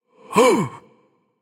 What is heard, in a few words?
Breath; smite; anubis